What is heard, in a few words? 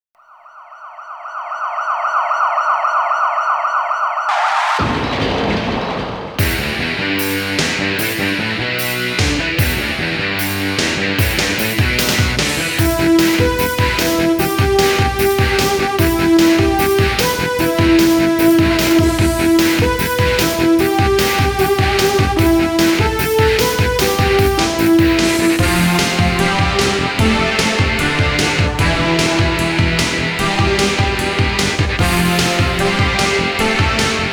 Guitar Rumba; savage reign anthology arrange sound trax gamerip; Gordon 2 Ending